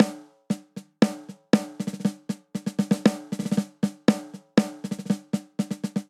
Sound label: Straight Three Rock Snare Only 3/4 118 bpm; MIDI Clips; Drum; Ableton; Factory Packs; Drum Booth